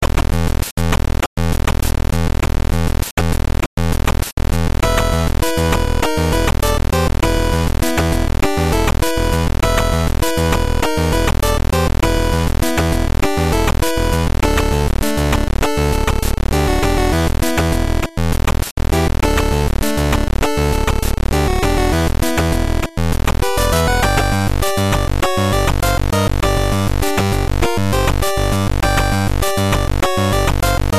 Round 5; Bomberman GB

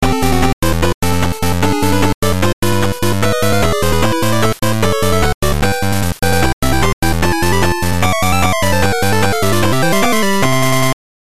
Boss Cleared; Bomberman GB

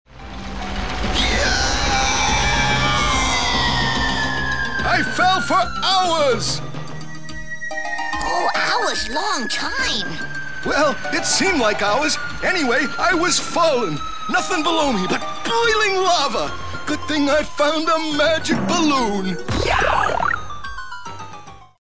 scream

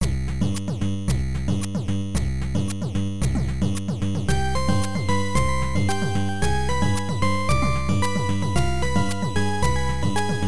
surf

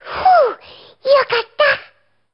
phew; voice

